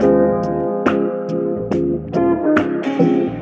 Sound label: guitar, Loops